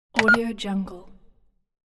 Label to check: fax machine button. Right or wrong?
Right